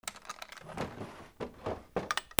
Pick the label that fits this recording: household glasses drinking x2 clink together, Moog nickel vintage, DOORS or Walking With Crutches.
DOORS